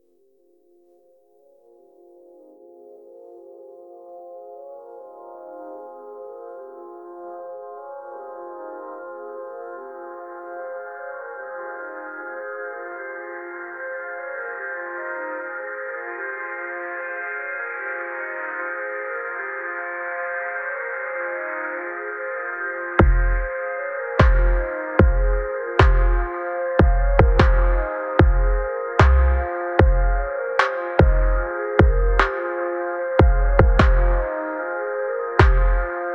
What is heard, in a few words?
psychedelic dreams bass